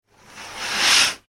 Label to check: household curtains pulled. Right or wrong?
Right